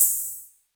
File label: Open Hat; The Lunch77 Sound Design Mini Kit (Vol. 1)